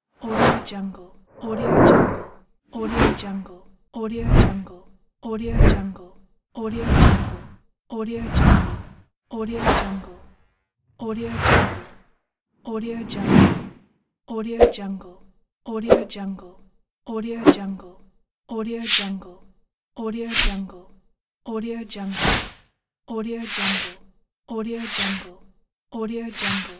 swoosh pack; evdokim music

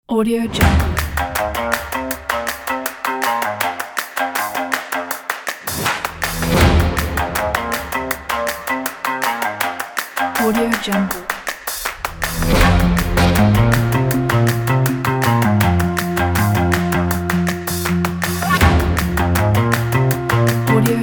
upbeat funk background

